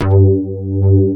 acid bass; basses; The Sample Bank

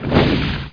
flamer; Pamela Anderson Interactive

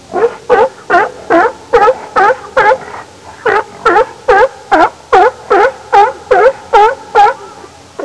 sealion, ocean animals; Sound Effects for Multimedia - Volume 1

